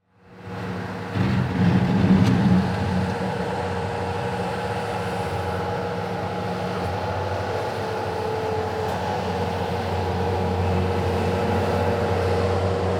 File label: Transportation Bobcat Moving And Dumping Dirt